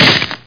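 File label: wood break; CD Action; fly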